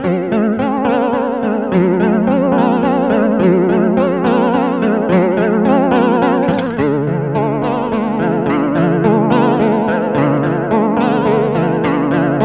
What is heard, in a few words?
vibrato; Digital Audio FX